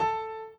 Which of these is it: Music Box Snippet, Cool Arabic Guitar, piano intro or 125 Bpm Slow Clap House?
piano intro